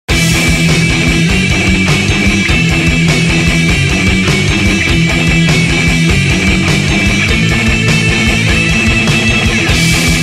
OLD CLASSIC METAL; Suite Coll. Vol.16

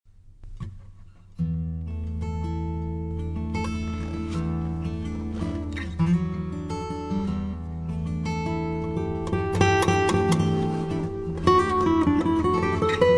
Rain, New Age; New Age Collection Vol.2

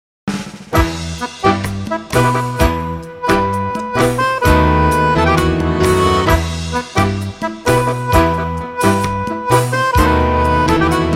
SIESTA TANGO

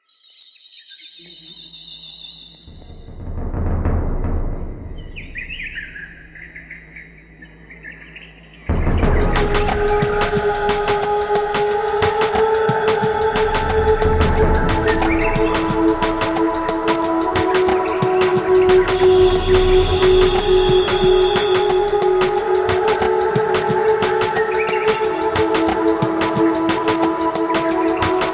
Deep in Forest, New Age; New Age Collection; Production Muisc vol 1; IDYLLIUM

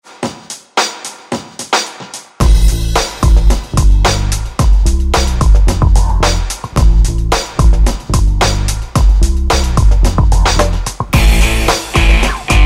COOL RIFF; Suite Coll. Vol.16

